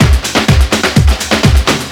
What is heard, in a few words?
stomp, Deep House, 125bpm